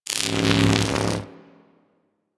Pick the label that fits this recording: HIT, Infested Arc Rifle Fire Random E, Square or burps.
Infested Arc Rifle Fire Random E